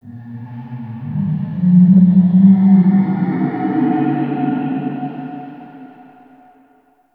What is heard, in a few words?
Root Cave Distant Moan; Ambience; One Offs; Neathuns Root Cave